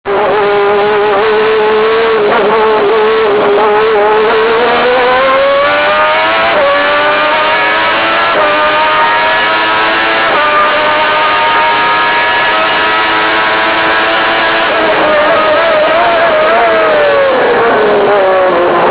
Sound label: mclaren, racing; monstr3d